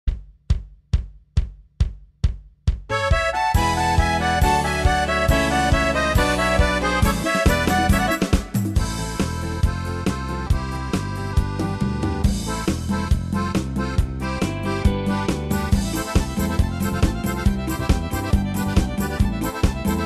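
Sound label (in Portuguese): sertanejo universitário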